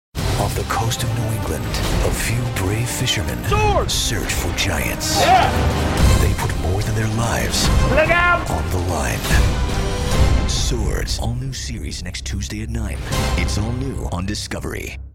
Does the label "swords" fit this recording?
Yes